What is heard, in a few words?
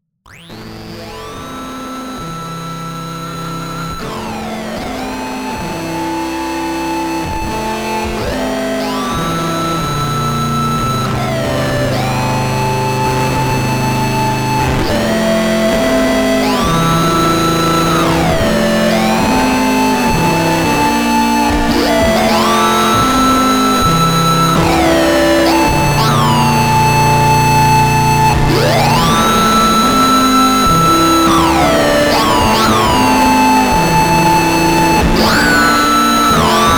birds lasers and blown electronics 17, 17-ET; micro